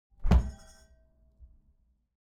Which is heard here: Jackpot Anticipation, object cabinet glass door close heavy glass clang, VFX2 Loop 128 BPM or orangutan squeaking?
object cabinet glass door close heavy glass clang